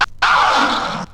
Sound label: Ahhh